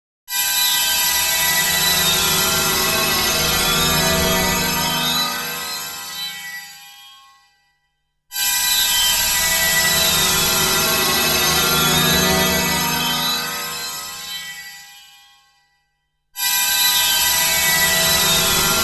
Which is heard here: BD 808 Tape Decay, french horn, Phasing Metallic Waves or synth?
Phasing Metallic Waves